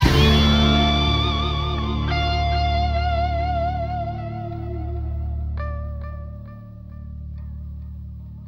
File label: guitar squeal